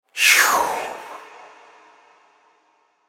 風切り音; 風切り音②

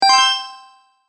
コインGET; 【効果音】コインGET音「チャリーン」

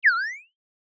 ヒュイーン

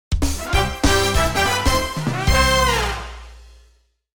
アイテムをゲットした時の明るいジングル; スターっぽい何かををゲットした時の明るいジングル

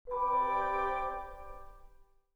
きれいな決定音; 綺麗な決定音

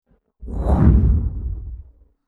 風切り音

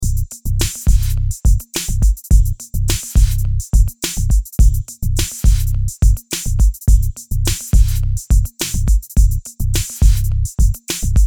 リスムオンリー; ドラム; 単調; シンプル; 簡単

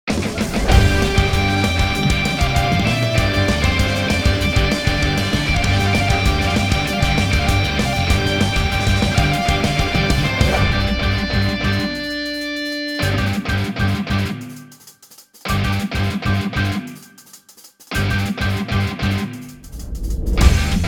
Victory, バトルに勝利した時に流れそうなBGM

